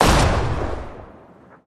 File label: Gun Sound; Gangstar; Gully Loops